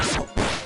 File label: Attack Zenithian Sword; DQIV iOS SFX